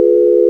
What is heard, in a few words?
sine; ものづくり基礎工学（情報工学分野）; 音の波形と分析